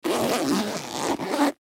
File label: Zipper Leather Bag Zip; Foley; Blastwave